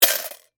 Coin Cup Insert, Foley, Coin, Cup, Insert, Quarter, Lid, Open; Money; BlastWave